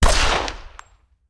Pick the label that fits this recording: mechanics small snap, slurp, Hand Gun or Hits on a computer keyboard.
Hand Gun